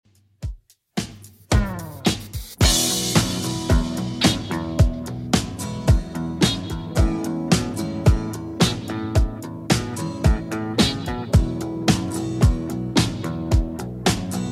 70s Redrum 110bpm CLEAN

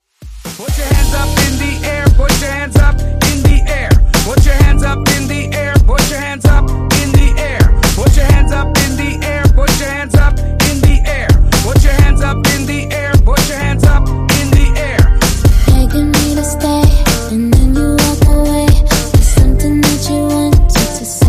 hype

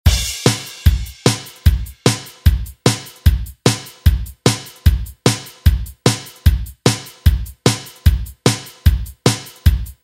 80s ReDrum; DJ Mhark

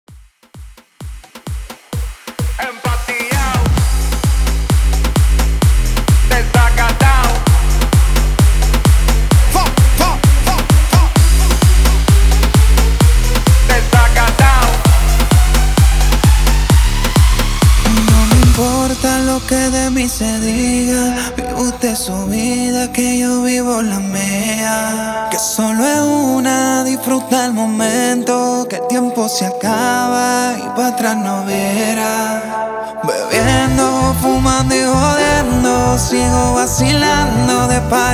BIG ROOM, 130 BPM

